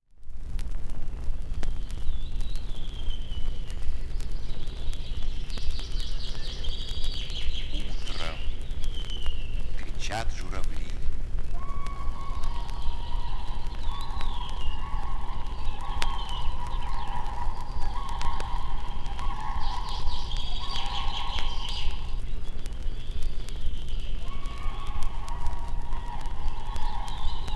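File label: bird voices in nature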